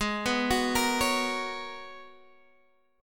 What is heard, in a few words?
Ab Minor 11th, Ab, Guitar, Drop D